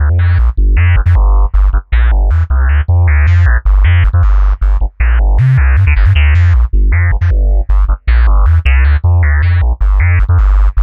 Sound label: Synth Bass